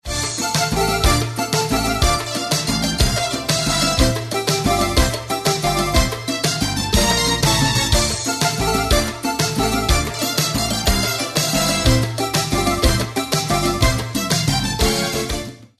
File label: Zang Mobile Shad